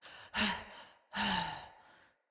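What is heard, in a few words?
Cry Heavy Walk Female; Battle Barks; Envoy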